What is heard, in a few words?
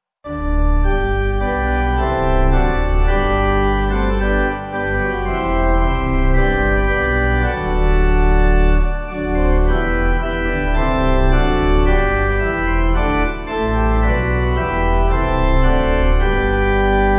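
Pipe Organ, Music Backing Files for Churches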